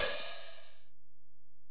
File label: HiHat, Alesis DM-PRO